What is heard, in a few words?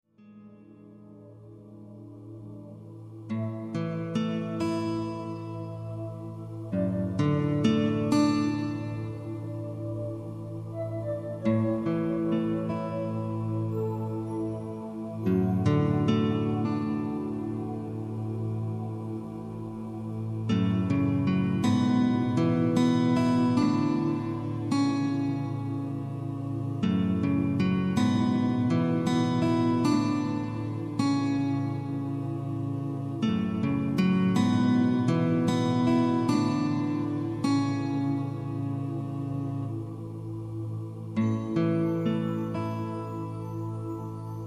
Instrumental One